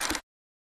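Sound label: Motor Small Spring, shutters & movement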